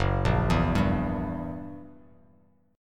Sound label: Gb 7th Flat 9th, Bass Standard; arpeggiated; JGuitar